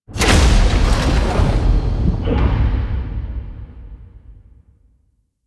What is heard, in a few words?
Vault Heart Start; Ambience; Warframe; Infested Eris